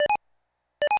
Battery Dead; Neo Geo Pocket Color; BIOS

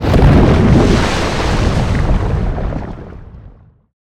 Field Mat Tap; Yu-Gi-Oh! Master Duel

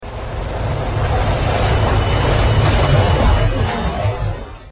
Subway

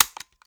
Button

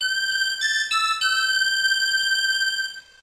Grass Whistle

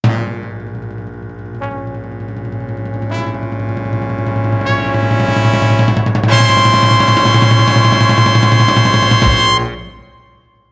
Ominous Interlude; Cuphead

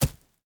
footsteps dirt single stomp dirt

